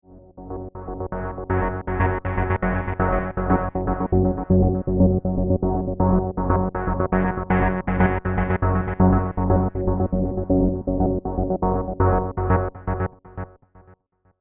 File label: Trance; mx4